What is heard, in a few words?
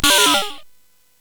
Jump Beam, CSG8580